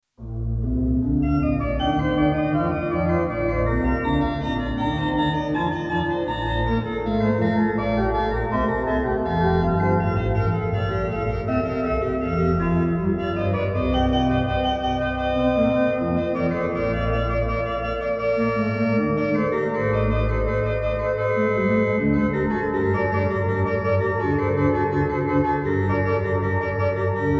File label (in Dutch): Triosonate deel 1; Stolzel